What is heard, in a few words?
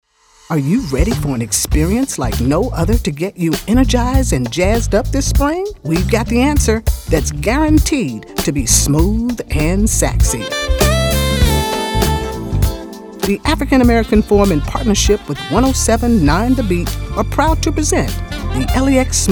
Smooth Jazz